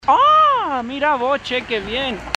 ahhh

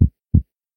single heartbeat

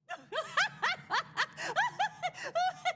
laughing; celestia

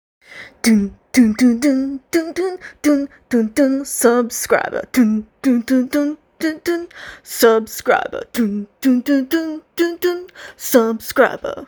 sub thunder

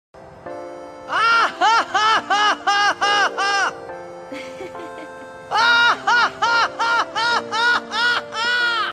laugh louder; tidus

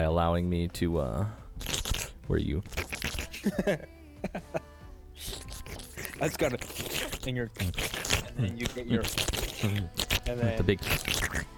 sketch slurp

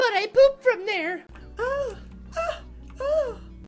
squealing poop

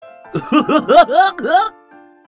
goofy laugh

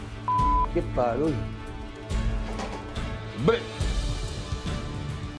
burp

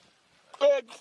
seal; seal egg

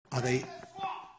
crowd at rally chant drain the swamp; donald trumps rally

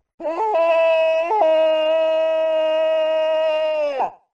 falling down; goron; It do Go Down

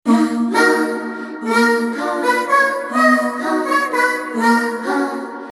scary BGM; Haachama

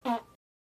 duck fart

